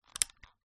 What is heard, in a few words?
leisure toy gun cock; zapsplat